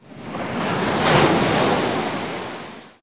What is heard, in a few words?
wave big; at the beach